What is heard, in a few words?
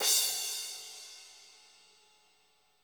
CRASH 2 -L, DRY KIT; Spectrasonics - Burning Grooves CD2